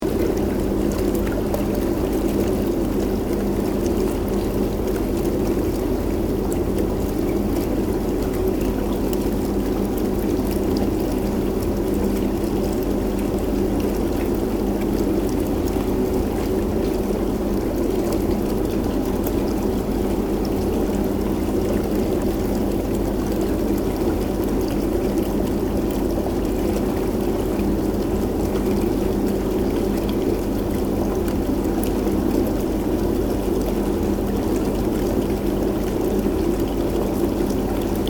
Ambience Spa Bath Operating, Hotel spa bath operating; Loop Stereo; Pools (Sound Effects:Backgrounds)